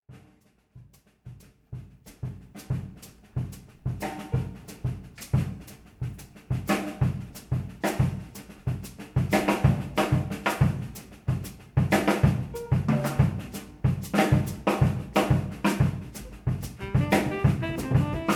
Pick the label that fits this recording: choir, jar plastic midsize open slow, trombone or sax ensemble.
sax ensemble